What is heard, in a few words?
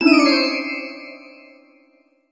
lose Game